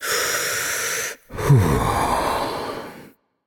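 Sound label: nonverbal breath; voicepack; smite; merlin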